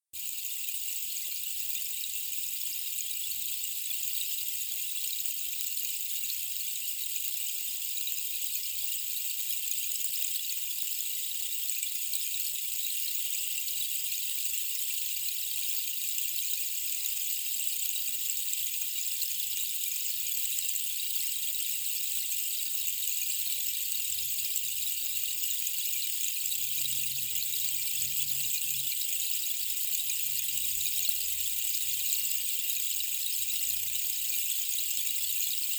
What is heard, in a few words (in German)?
Regensäule Bambus 50cm